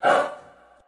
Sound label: "owe", Voice